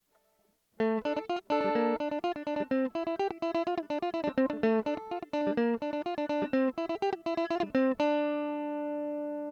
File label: mandolin comparison electric di